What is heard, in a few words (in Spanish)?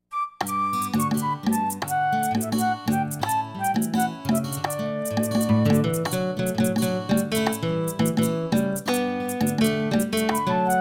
La Entrevista (No Vocals)